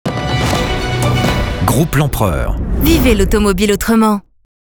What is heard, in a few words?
Jingle; idsonore